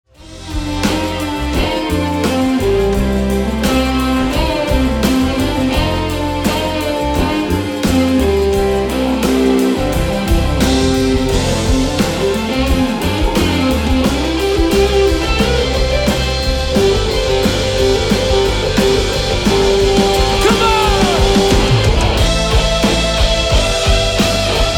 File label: soul band; Surrey